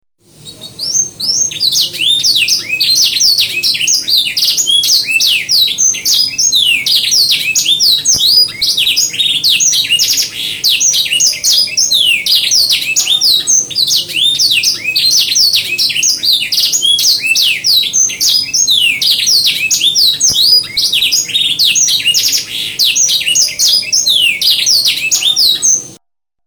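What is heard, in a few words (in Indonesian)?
suara kicauan kenari isian full, canary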